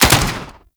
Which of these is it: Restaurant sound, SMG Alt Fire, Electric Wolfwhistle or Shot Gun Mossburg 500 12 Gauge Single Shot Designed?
SMG Alt Fire